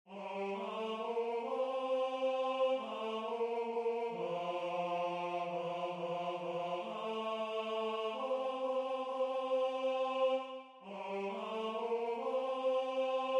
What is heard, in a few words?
Tenor